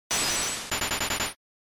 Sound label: SNES RPG Magic Ice